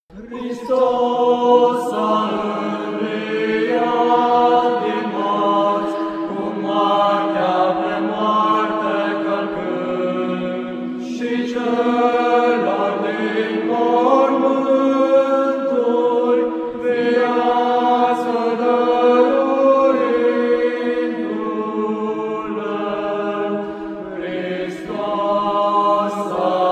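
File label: Christos Anesti Romanian; Stoudion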